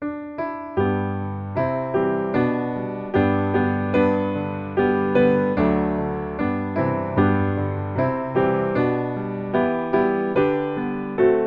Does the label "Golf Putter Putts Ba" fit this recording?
No